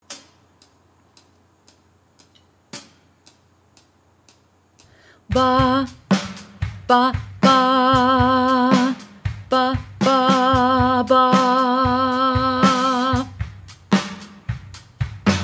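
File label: Summary in 5 4 with Drum Track Ba Ba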